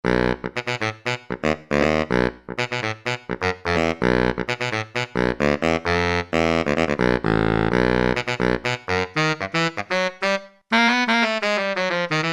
Baritone Sax, Roland SR-JV80-01 Pop

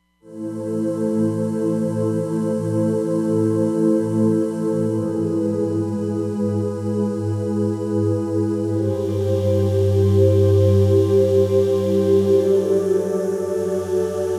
Angels Choir, Roland Fantom XR

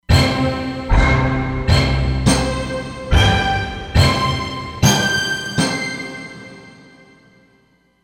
Multi Hits, Roland Fantom XR

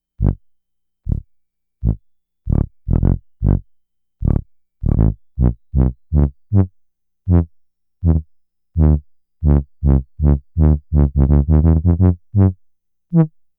Tuba, Roland SH-2000

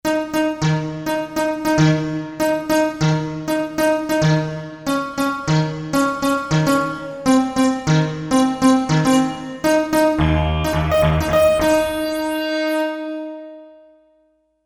Dinosaur, Kawai K5000S factory presets

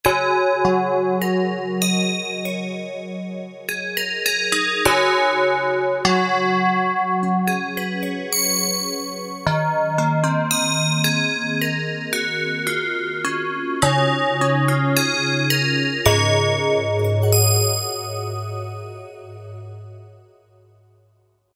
Chimes, Roland JD-990; Factory Patches

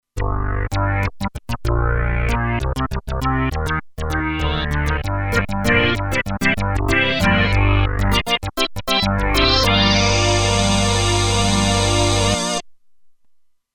Chowa Organ, Roland Alpha Juno-2; Original Sound Chart patches

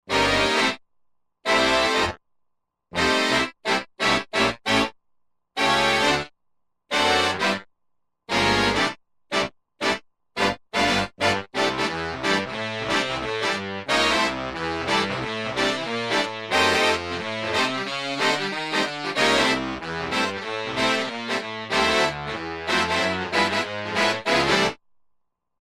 Bigband Sax, Roland JV-2080

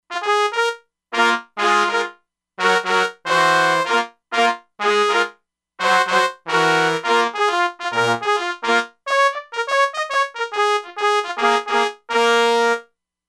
Two Trumpets, E-mu Proteus-2; Factory Presets

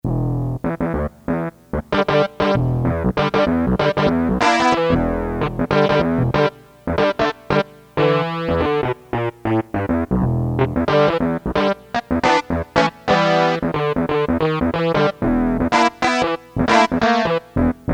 Funky Clavi, Casio CT-370; Tones

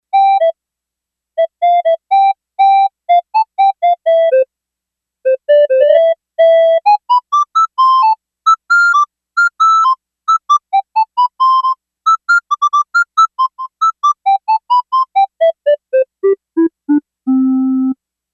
Recorder, Yamaha DX11; Factory Preset Voices

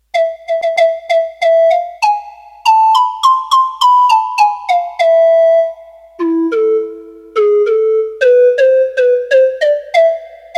Pan Pipes, Roland D-10; Factory Patches

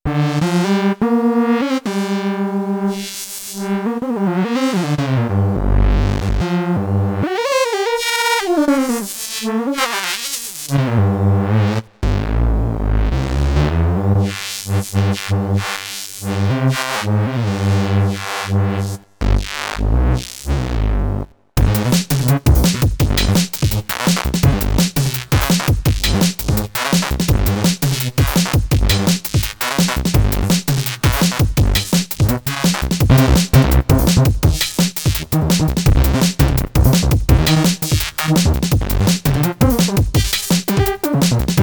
Synth, Korg Radias-R; Factory Presets; NuSkoolSynth

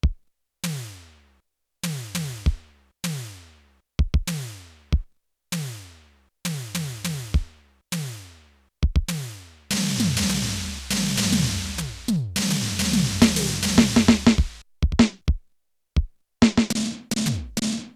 ALL SIMMONS ACTIVE, Electronic Drums

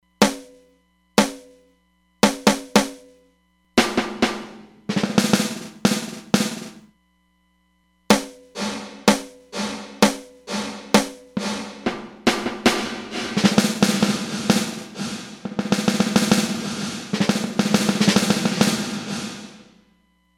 Snare, Roland DEP-5; Factory Patches

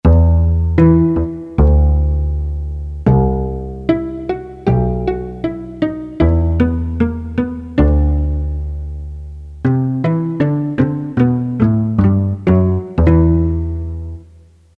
Bass Pizz; Korg DSS-1; KSDU-007 Bass Groups

